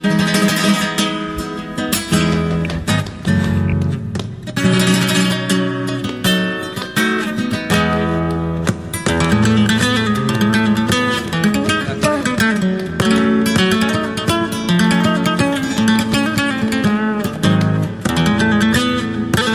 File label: Flamenco Guitarist; Seville